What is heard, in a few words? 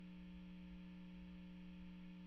GTR